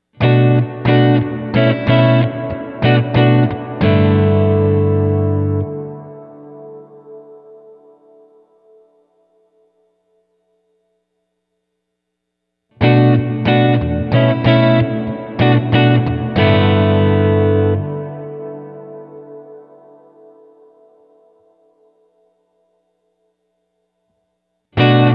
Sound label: hall mid Param half min full; strymon big sky; reverb; Back Row